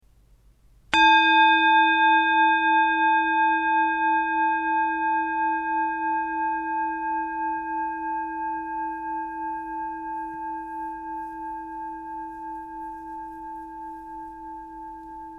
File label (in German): Klangschale; 512g